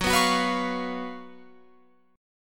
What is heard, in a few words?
F sharp Major 7th Suspended 4th, Guitar, Open F; F#M7sus4 strummed; 18,18,18,18,20,20